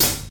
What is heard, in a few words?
Crunchy Closed Hi Hat Sound D Key, closed hi hat sound tuned to the D note; hat samples